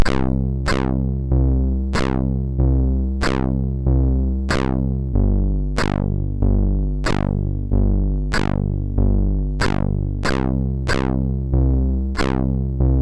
sid MOS6581R2; Taevlings Bidrag 2

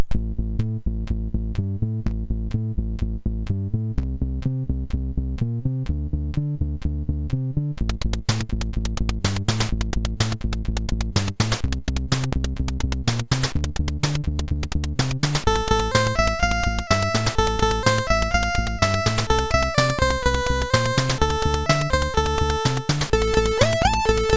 Bass Fever, MOS6581R4

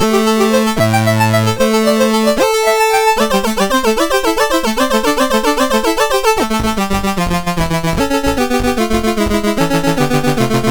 Riff, MOS6581R3

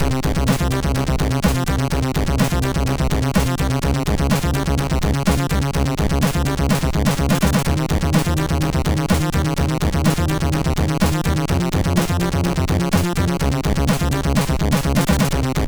Techno Vision, MOS6581; MOS6581R2; sid

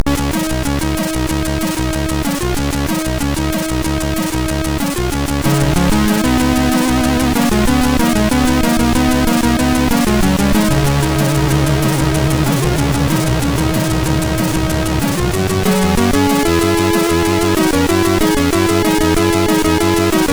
Vocal, MOS6581R3; sid; Jewels Vocal

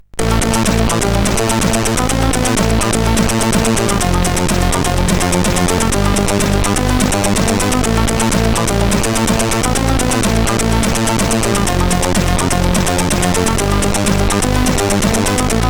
tune, CSG8580R5; No Single Pixel Raster

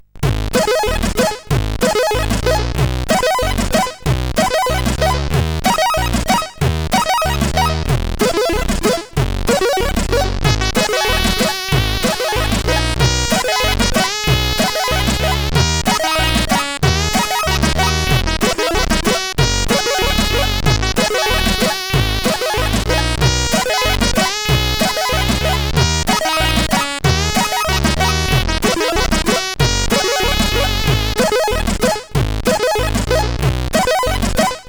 Strange Rythms, sid CSG8580R5; hvsc

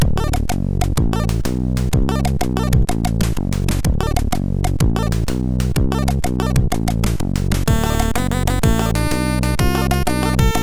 MOS6581R2 sid

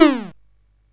Dark Castle, CSG8580R5

